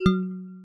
glass land

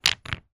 question break

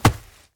dirt land

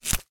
flowers change

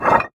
stone change

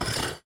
brick change

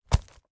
dirt place

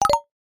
ice change

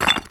stone place

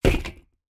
wood iron change

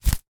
flowers place

